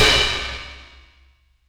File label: CRASH, DRUM N BASS KIT; JUNGLE BEATS